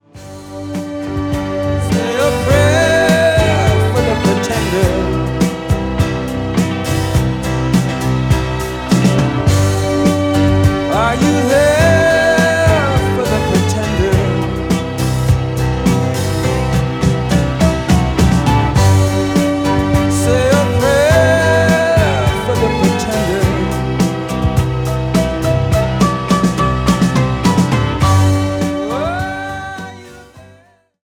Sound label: male pops stylus